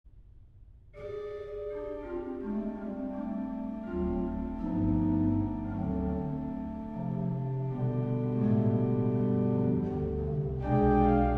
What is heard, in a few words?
mourning blues